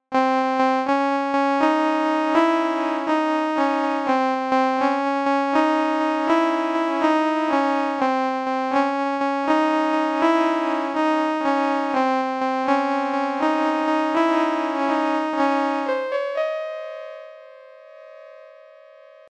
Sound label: Sinus right; Dicipline